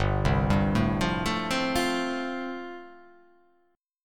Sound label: G 9th Suspended 4th